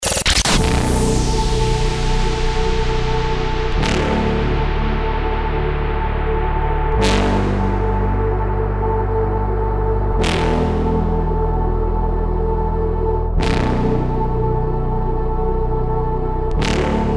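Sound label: drone, build it up, 150 bpm, B minor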